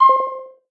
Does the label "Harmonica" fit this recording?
No